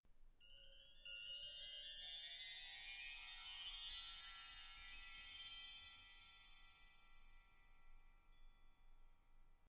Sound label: Chimes